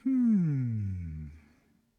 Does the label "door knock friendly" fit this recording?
No